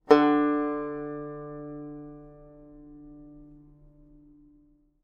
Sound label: banjo